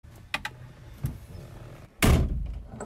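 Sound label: sounds of Niva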